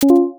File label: subscribe pop